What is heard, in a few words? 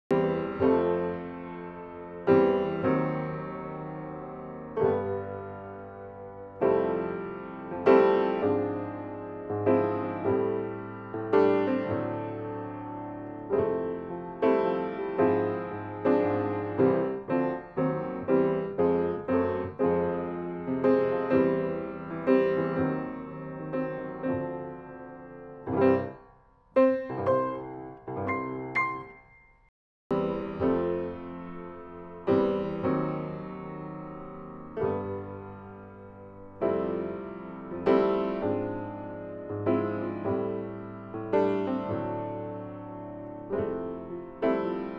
PIANO